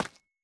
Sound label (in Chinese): 方解石踩踏声